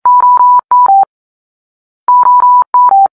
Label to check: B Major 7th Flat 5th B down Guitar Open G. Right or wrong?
Wrong